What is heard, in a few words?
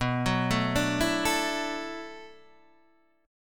B-Minor 11th B down Guitar Open D